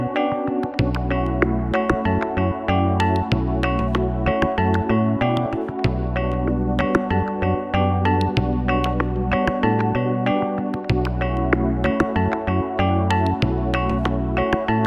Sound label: bali relaxation lounge